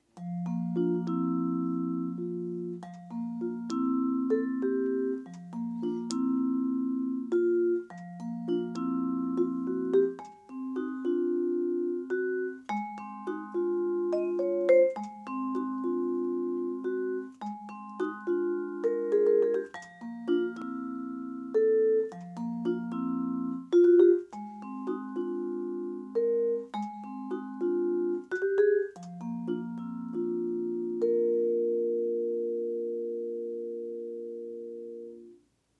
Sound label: dampening etude fast with dead strokes, vibes; harrison gelber; open source